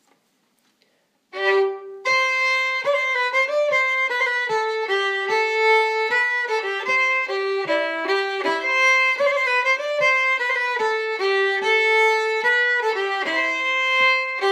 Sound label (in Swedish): Ridmarsch i C-dur